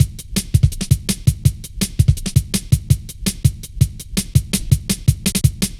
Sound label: light stepz, drum loops; Zero-G Jungle Warfare 1, 2 & 3